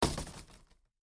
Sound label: impact; The Loud House - Extreme Cardboard Racing; NextlevelGames